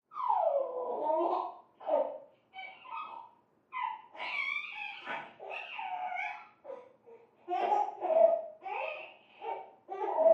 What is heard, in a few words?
Baby; Happy And Excited Squeals And Laughs, From Next Room.